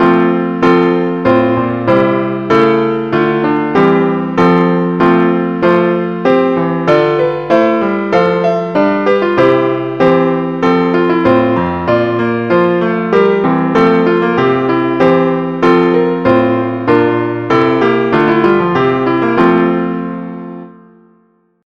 BWV290 nat F diesis

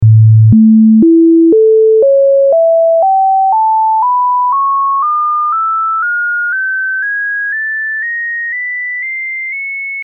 20 armonici naturali 110 Hz

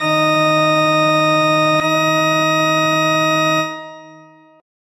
Comma pitagorico

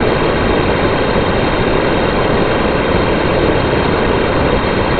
Rumore armonico

